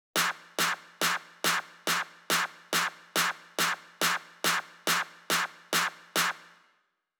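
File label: 140 BPM Drum Clap snare; Vengeance Essential Clubsounds Vol.5; VEC5 Demo Constr Kits; Nessi Kit; Root A; Drums & Perc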